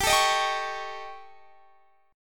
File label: A 7th Flat 5th G